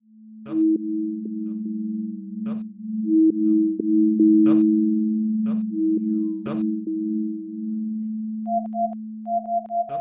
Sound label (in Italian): Studio sulle sinusoidi